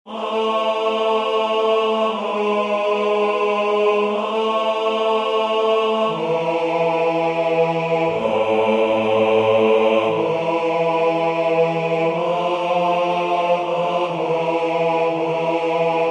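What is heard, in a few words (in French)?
basse, Musique sacrée; Jesu dulcis memoria; Victoria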